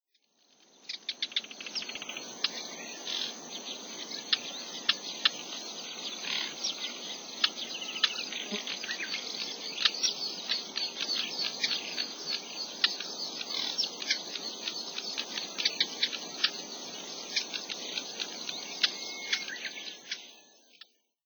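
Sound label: Black-browed Reed Warbler; koe; watarase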